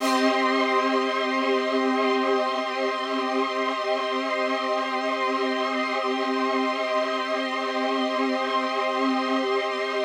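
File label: Poly String C minor, synth orchestra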